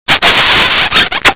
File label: kiss